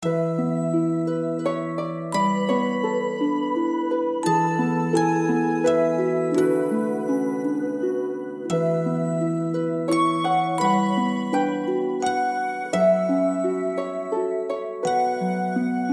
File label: BGM; IdleGirl